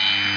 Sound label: Saw; PC Gamer IT CD 41; machines demo; Interfer